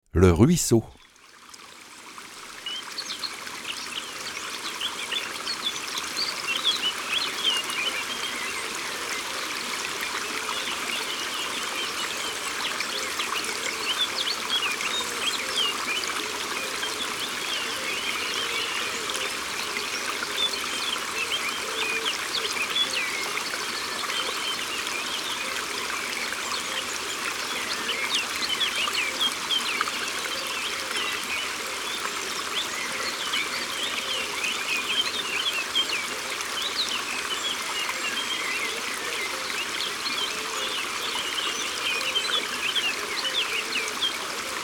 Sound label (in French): le ruisseau; Mon imagier sonore de la nature